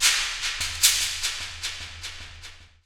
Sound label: Shaker; Forest